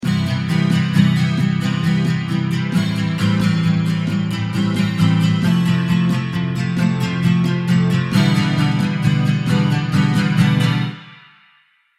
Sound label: strumming pattern